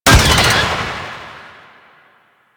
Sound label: Rocket Cluster Ability; Warframe; Kela De Thaym